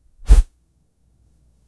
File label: soft air woosh